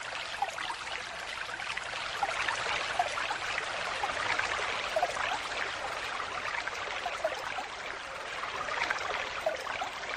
Water Falling Sound

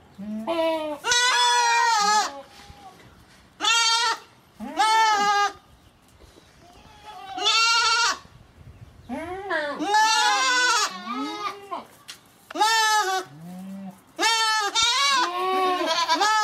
Goat Sounds

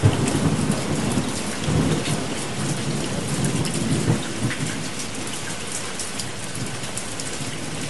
Rain Sounds for Sleeping

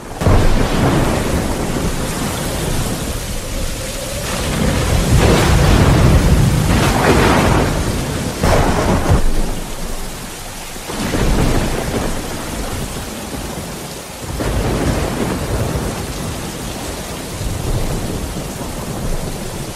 sounds of thunder thunderstorm rain sounds

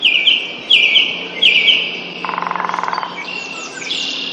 Nice Bird Ringtone

